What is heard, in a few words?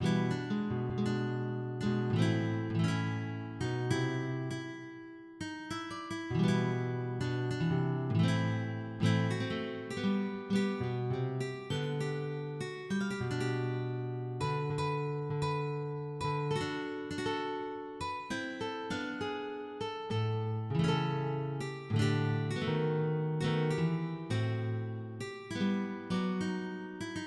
Sarabande, MIDI